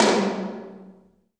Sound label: TOM TOM, Roland